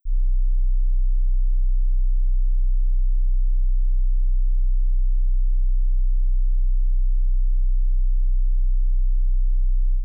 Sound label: 31.5Hz